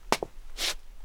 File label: Foot Stop